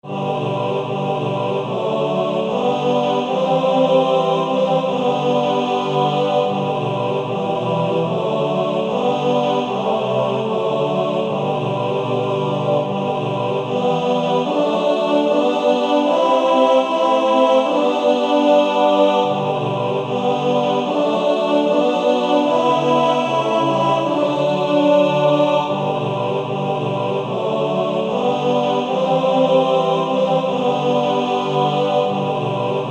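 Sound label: TBB, Practise Music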